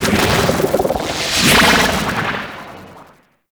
Transference Orbiter Operator To Frame Cast; Warframe; Focus

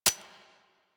Fire Mech; Warframe; Vipers

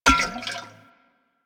Mech Oil Grenade Bounce; Warframe; Vehicles; NechroMech; Abilities

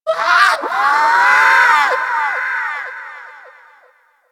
Sea Bird Captured Lift Away; Warframe; Hunting Critters; Ostron Sea Bird

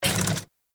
Mech Step Arm Up; Nechro Mech; Vehicles

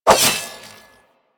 Nikana Swing F; Revenant

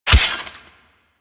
Melee Sword Impact Robotic Flesh; Warframe; Weapons; Swords

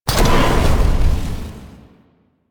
Void Dragon Firebreath Mech; Warframe; Duviri; DuviriVoidDragon; Weapons

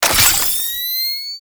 Laser Flare Activate; Warframe; Lasrian; Lasrian Soldiers; Abilities; Prone Lancers

